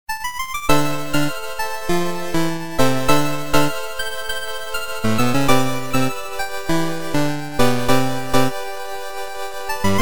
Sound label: 8-Bit; drakkhen; Earth Days